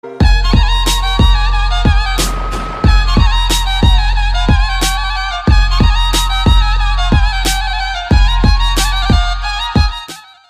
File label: New Arabic Ringtone